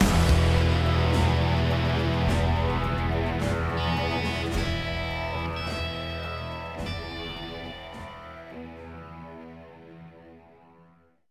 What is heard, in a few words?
Action END; bolt 2008 pc gamerip